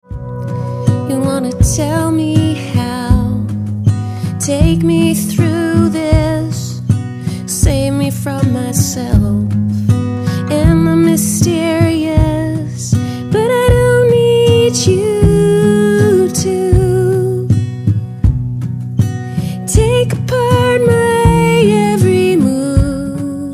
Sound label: Breaking